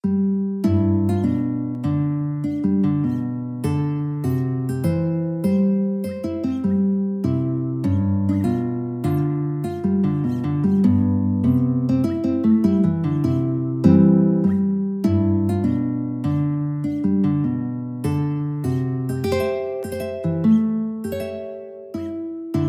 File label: Prelude, guitar; Chopin; Prelude28 7